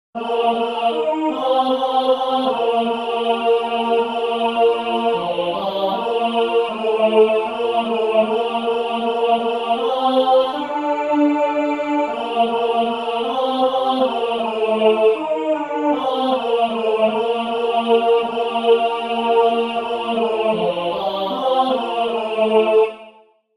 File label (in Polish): Tenor na tle SATB; Skosztujcie i zobaczcie